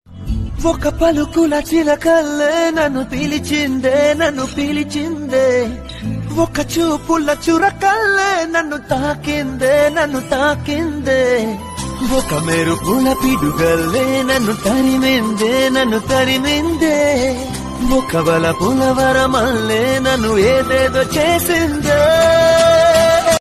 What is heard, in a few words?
telugu love songs; singam movie